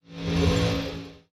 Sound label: trident glow; smite; poseidon